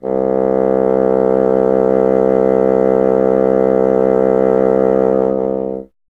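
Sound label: wind bassoon C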